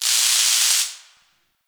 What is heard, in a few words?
Destruction Electricity Sparks; Corpus Railjack